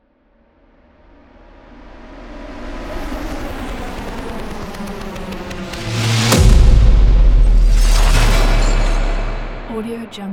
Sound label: rising climax cinematographic impact; anderington